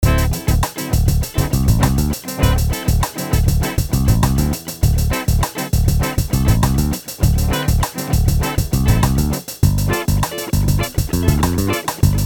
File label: improv 12 bar blues